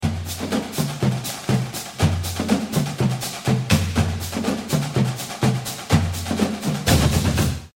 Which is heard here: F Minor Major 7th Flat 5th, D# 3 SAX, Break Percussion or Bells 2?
Break Percussion